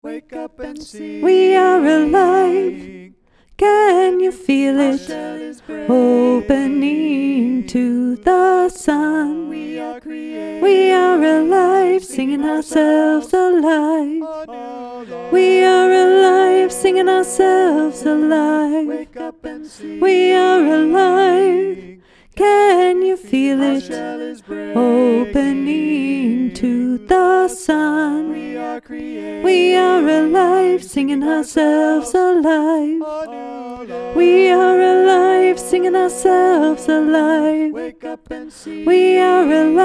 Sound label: Soprano; 2023 Fall Repertoire